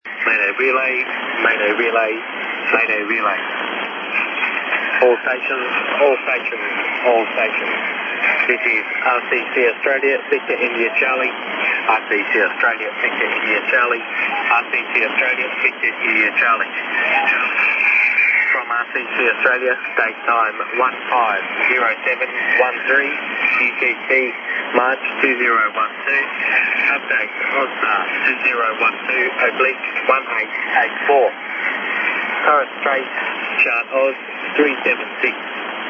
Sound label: 8291 khz australian